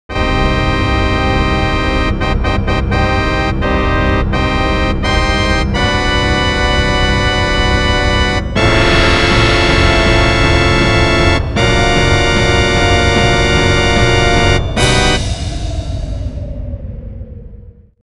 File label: Firing; Banjo-Tooie